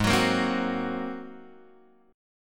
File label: G sharp 9th, Guitar, Open G